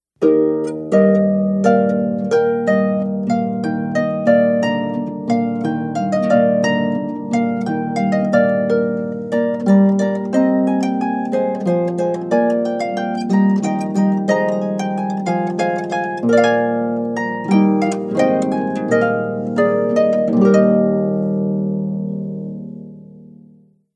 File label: Hornpipe, harp; Handel